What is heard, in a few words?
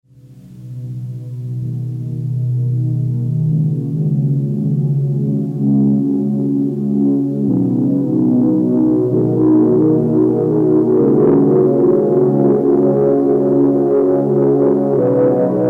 pad